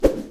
swing; minetest; mobs redo

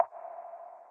dripping water; minetest; ambience

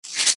paper dig; minetest; minetest mtg plus

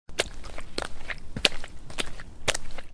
bbq basting; minetest

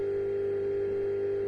chest inventory move; minetest; basic machines

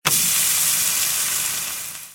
bbq sizzle; minetest

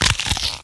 eat; minetest; stamina

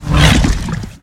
enter Event Horizon, stargate; minetest

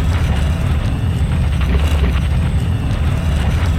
gate Spin; minetest; stargate

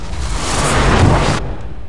gate Close; minetest; stargate

